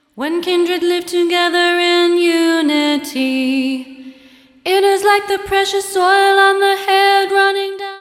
psalm chant

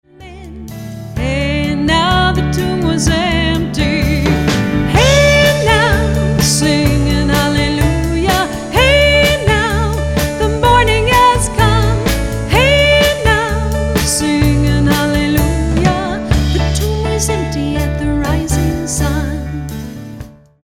Singing Hallelujah

